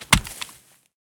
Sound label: pistol drop grass; STALKER; IWP